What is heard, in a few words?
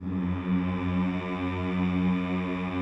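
choir F#1, Mix-Combi; Sound & Vision - Gigapack I CD 2 (Roland); Combinations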